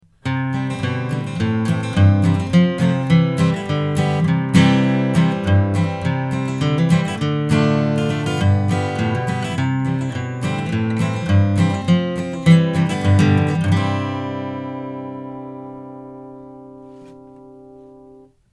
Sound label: chords